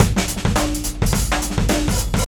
DRUM 107

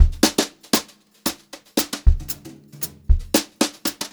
Brushed Drum Loops, JAZZY

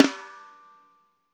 LOFI, LO-FI KITS

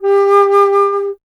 Alto Flute, Solo Instruments

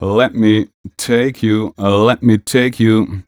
m 127 mel a, Vocals

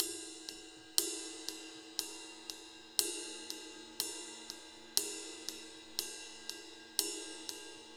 New York Loop, Drum Tools, Laidback

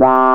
DIRTY CLAV, clavinet; Zero-G; Funky Elements

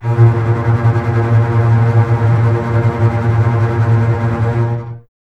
A#2, Basses Tremolo, String Ensembles; Miroslav Vitous; 90 s Sample CDs

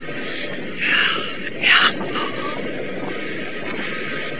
AMB WHISPER; Synth

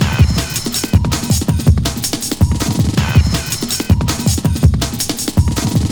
162, breaks; M8

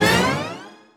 Pitched Sting; Orch Perc and Stings; cartoon; musicradar; cartoon caper

